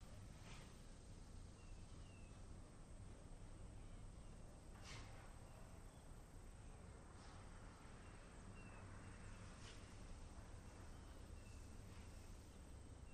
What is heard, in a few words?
Train Steam Train Slo